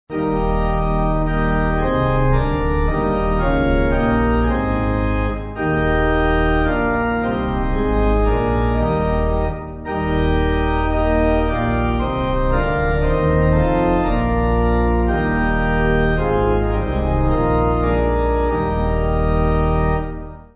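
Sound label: Pipe, Pipe Organ backing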